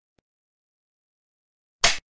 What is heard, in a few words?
clapperboard sound effects